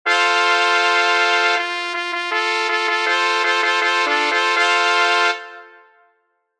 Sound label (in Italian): Tromba in Do; Le due bandiere